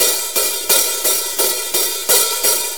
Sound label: 4-4 HiHat Loop; 4-4 Drum Loops; HiHat Ride Loops; Sonic Foundry; Drum Tools